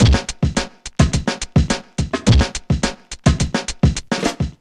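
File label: 106 bpm, Funky loops; Zero-G; Funky Elements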